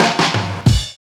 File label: Fills; Sound & Vision - Gigapack I